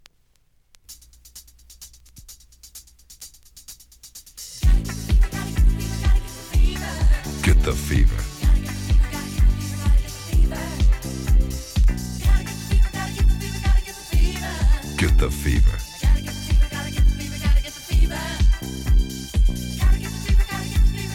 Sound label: topformat maxi jingle single